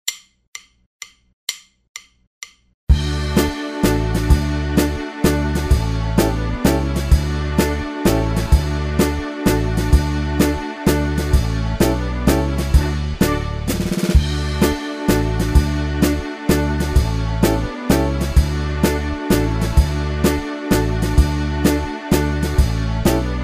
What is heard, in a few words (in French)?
mazurka fantaisie